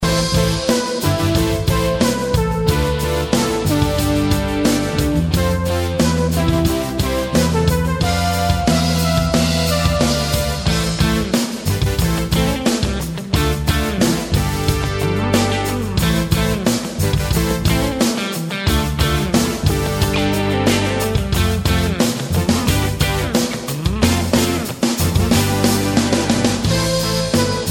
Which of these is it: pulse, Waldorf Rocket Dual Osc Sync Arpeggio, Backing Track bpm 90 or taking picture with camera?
Backing Track bpm 90